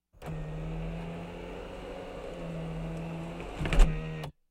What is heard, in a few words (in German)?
schiebedach schließen, Auto; Auto-Zweiraeder